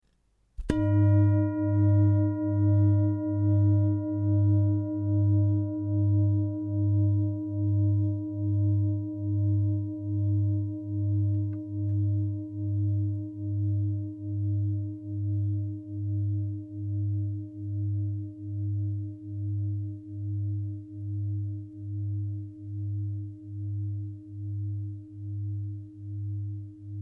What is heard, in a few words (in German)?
Klangschale